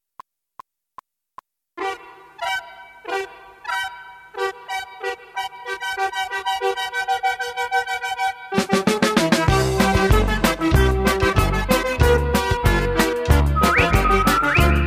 Accordion C

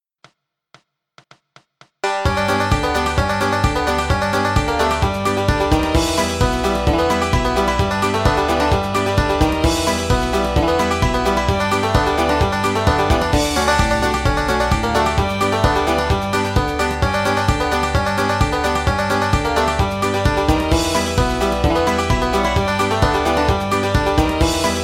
Bluegrass Banjo, G

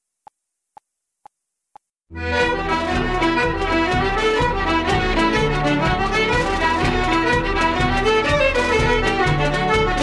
Traditional Irish Jigs Medley Em; backing track

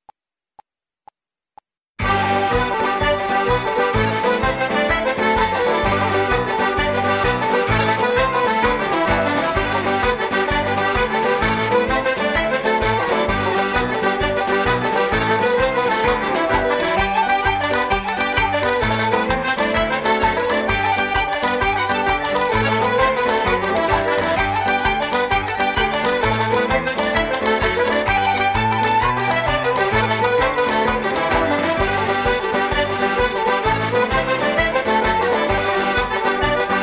Traditional Irish Jigs Medley G

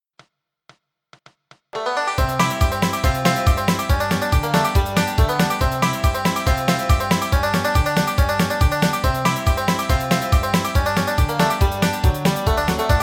Bluegrass Banjo fiddle G; John Hardy

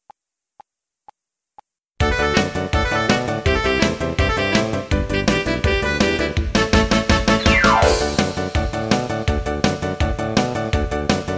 Medley Irish Pop D; backing track

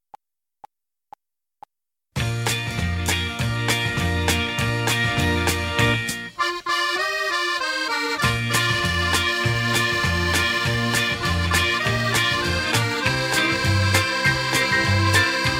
Accordion Bb; backing track

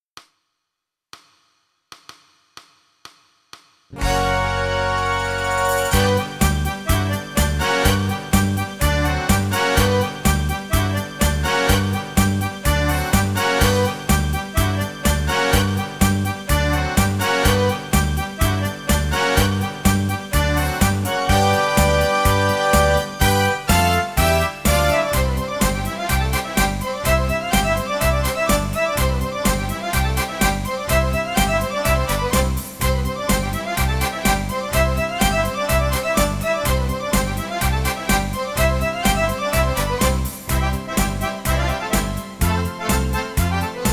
Irish Rock Irish Hoedown Medley G

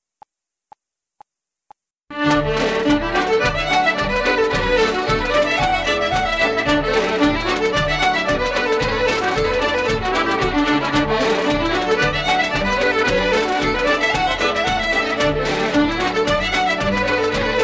Irish Ceili Reels Medley D, backing track

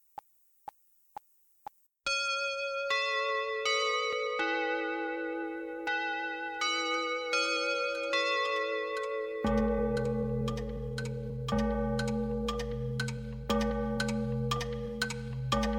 Christmas Auld Lang Syne; backing track

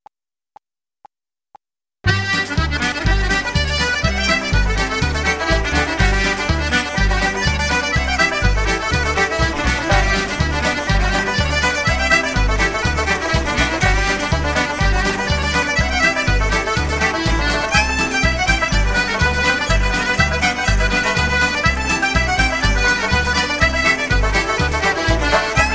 Traditional Irish Reel G; backing track

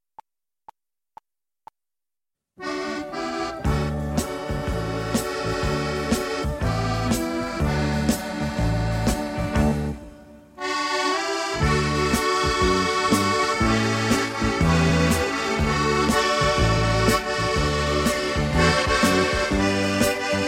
Accordion Medley, D; backing track

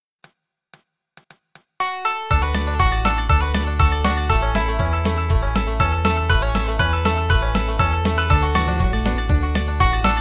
Bluegrass Banjo fiddle D

